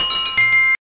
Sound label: connect; Eric's TelNet98